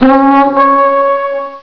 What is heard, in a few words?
horn; hipitems